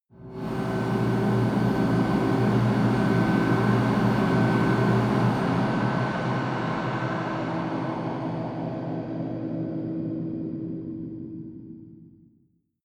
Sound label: atmo stinger, horror